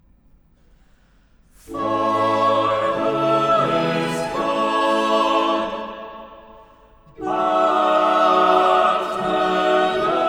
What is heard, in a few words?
Chorus; George Frideric Handel: Chandos Anthem No. 10, The Lord is my light, HWV 255 – Chorus – For who is God but the Lord; Musica Gloria; Bruges; sacred music